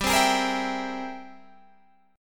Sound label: Ab Augmented Major 9th G, guitar